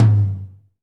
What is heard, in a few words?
TOM; Best Service Gigapack 2